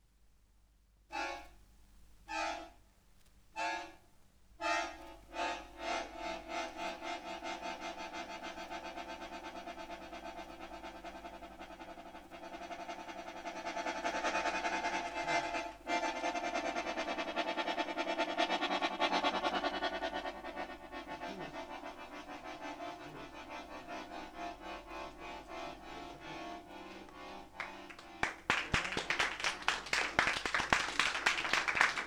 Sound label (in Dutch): pauze met accordeon